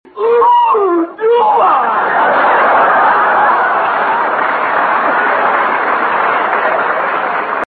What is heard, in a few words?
Ooooh